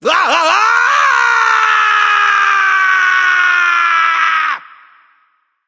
fear; smite; voicepack; loki

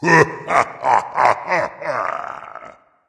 laugh dragon; fafnir

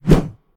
whoosh; Smite; god Lancelot

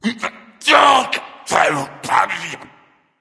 grumbling; cuchulainn

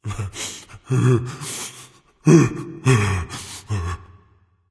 boohoo; smite; voicepack; raijin

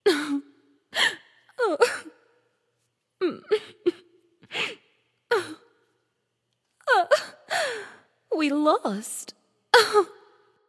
boo hoo; smite; themorrigan

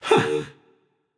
sigh, voicepack; smite; jorm

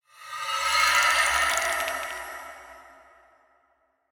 scorpion incap healpad; smite; npc

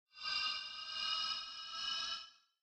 idle spell; smite; god ganesha skin11

